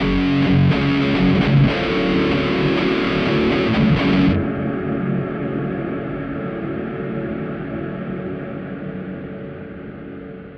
guitar effect

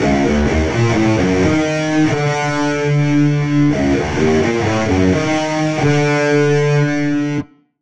Rock Guitar; Recording One Rock Guitar; Zystrix

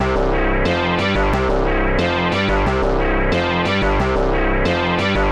Bass loops; Zystrix

